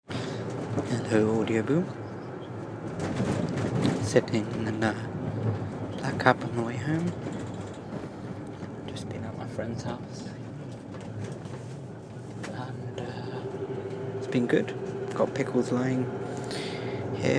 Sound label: booing from a london black cab